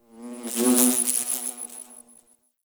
Insect Close; Warframe; Eidolon Plains; Ambience

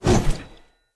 Short Sword Whoosh Heavy; Weapons; Enemy; Swords; Short Sword Elite

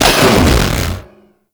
Shield Polarize Damage Burst; Warframe

